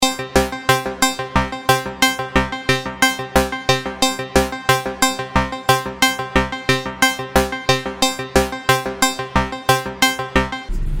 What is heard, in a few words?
ambient loops